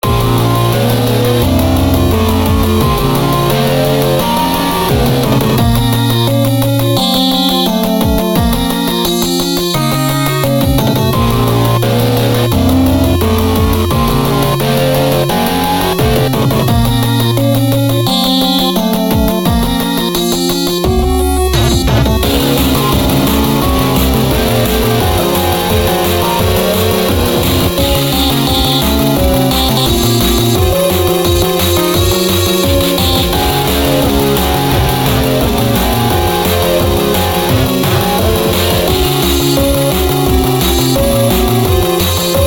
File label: Powerdown (With SFX); Friday Night Funkin Mario's Madness